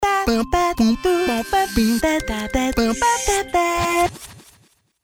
vox tag

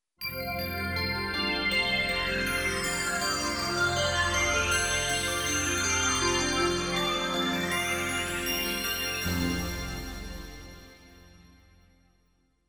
space jingle

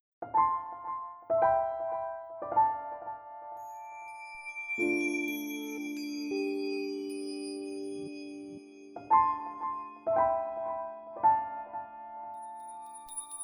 raining piano

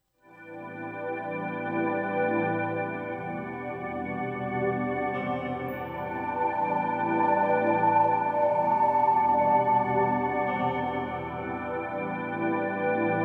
forest calling, melodica

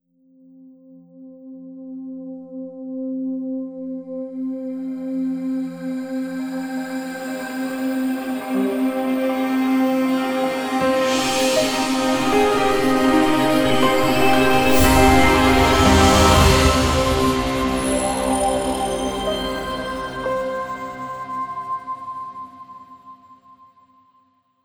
festival jingle

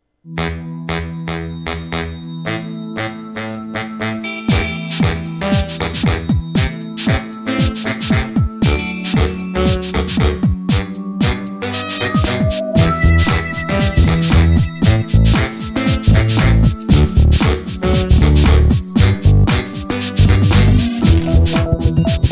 cruiser boat, saxophone

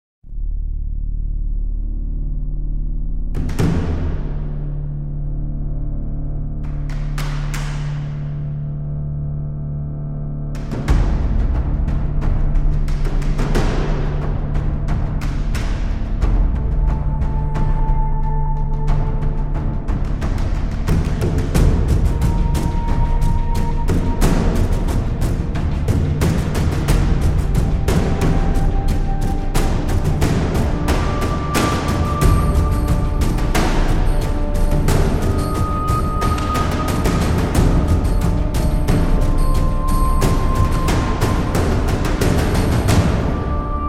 tribal war

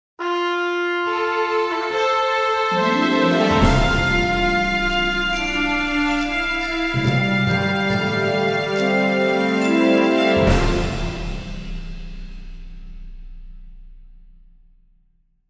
aventure jingle

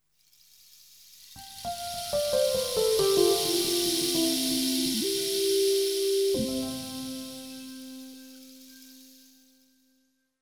savane jingle

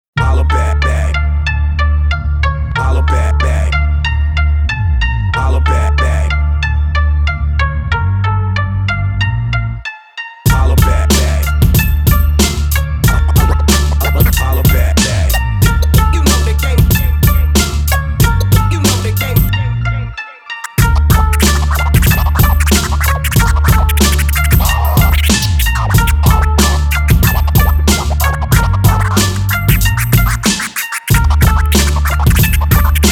ethnic game; a-daptmusic

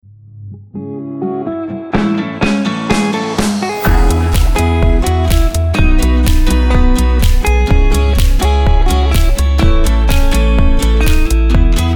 sound frequency jingle

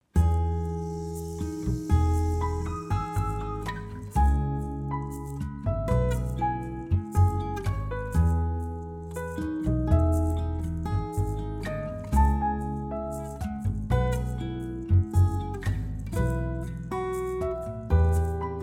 soft jingle

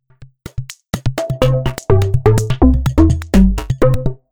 digital percu tag

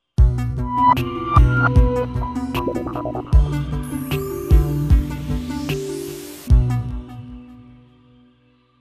dark jingle

